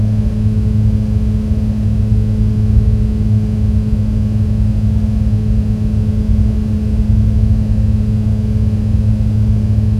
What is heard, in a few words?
unpowered sailplane glide; aviation